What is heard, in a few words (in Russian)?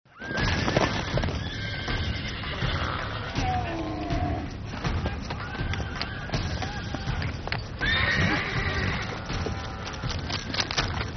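преследование врагов эффект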